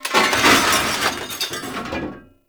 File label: Desktop Metal Destroy; Warframe; Entrati